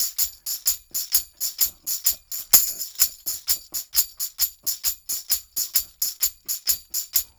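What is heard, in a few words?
TAMB, tambourine; Drumdrops In Dub VOL-1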